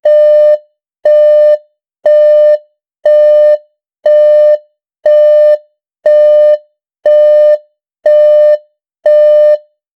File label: a sound effect to play, alert